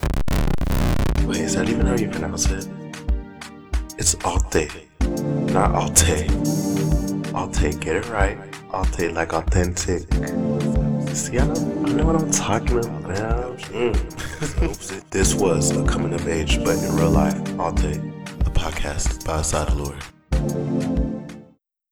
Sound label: outro